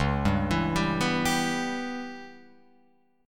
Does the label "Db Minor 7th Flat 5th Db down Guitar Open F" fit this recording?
Yes